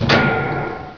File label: bump; quake2; smasher